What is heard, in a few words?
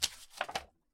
Paper